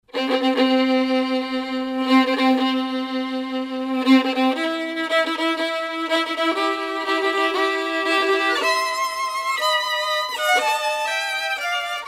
Wedding March 2 violins; Wedding March (Mendelssohn)

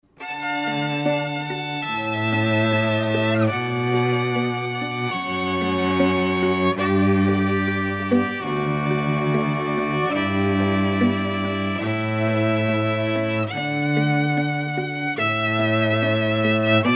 Canon in D Trio, string

